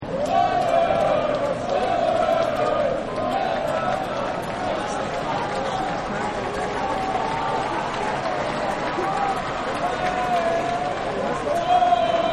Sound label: Stadium Chants Ambience, Crowds; Backgrounds; Stadium Chants Ambience-Norm 01